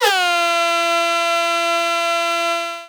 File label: airhorn